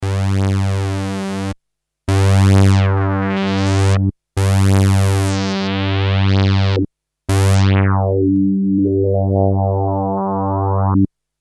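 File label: allfilter, Emu Planet Phatt rompler; TEST: all filter; a Motorola MC 68000 CPU (mc68hc00fn10) system handles up to 32 voices of polyphony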